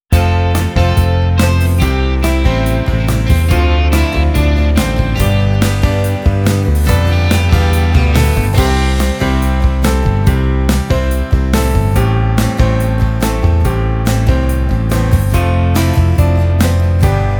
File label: Instrumental; Kids Liturgy; Year A